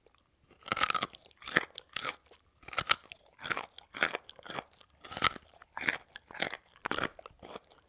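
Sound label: carrot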